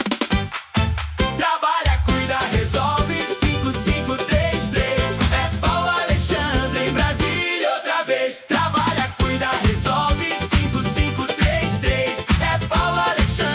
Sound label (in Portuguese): refrao jingle campanha